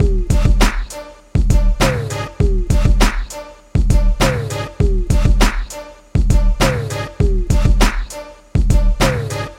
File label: Beats